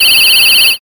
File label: whistle long